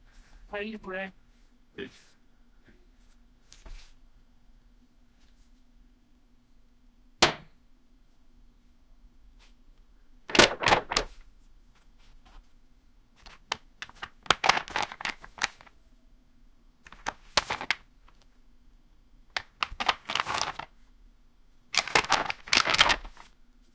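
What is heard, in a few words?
fisheye lens feet shuffle, documentary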